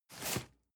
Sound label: cardboard box medium slide short hard; objekte